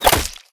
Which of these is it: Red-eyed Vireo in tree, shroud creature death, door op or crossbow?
crossbow